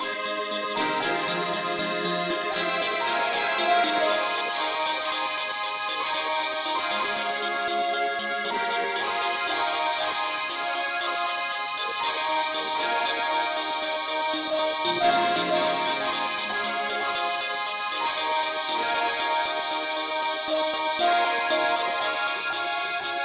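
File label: pop man lead, key a flat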